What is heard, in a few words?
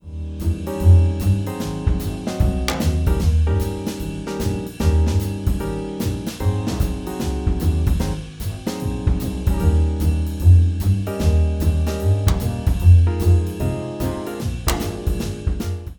Backing Track